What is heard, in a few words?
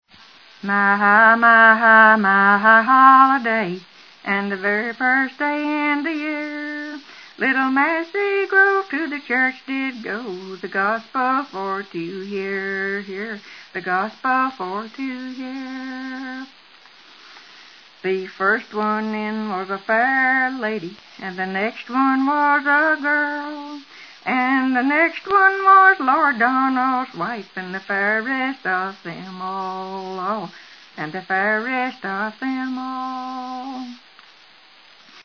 ballad